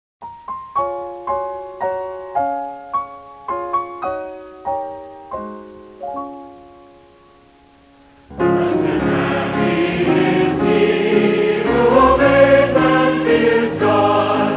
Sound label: Blest And Happy Is He, Hymnal Chorale; Church Materials; AC Chorale